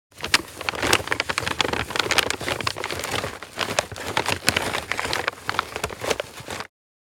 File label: Large paper bag handling